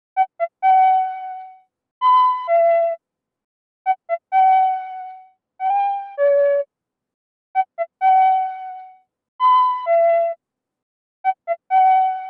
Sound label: Flute, 130 BPM B Min; Cymatics; 2022 Melody Mystery Expansion; Indigo